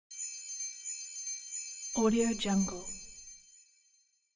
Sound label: heavenly windchimes in a cathedral